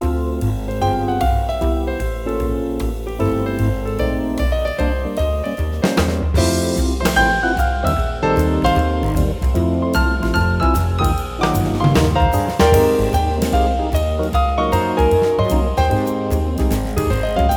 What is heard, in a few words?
Bar Music; Tales of the Neon Sea; iOS PS4 PS5 Switch Windows Xbox One Xbox Series X/S gamerip 2019